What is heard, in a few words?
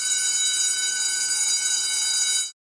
school bell; Sound FX Real Life